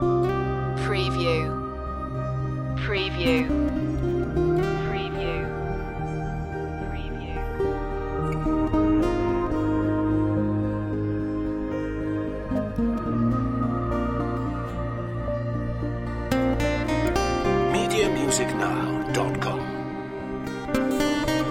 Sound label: landscape colors loop; pop